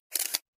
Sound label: Camera Shutter